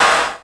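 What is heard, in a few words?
no cut snare drum; Best Service Hyper Dance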